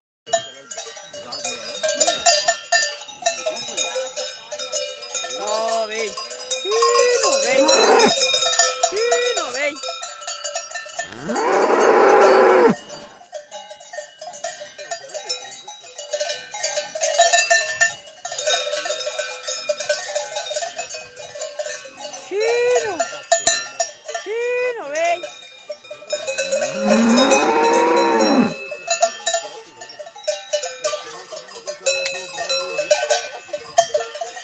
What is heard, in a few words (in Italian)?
mucche al pascolo